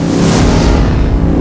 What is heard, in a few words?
power